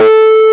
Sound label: MILD HARM A2, GUITAR; AKAI S-Series CD-ROM Sound Library VOL-8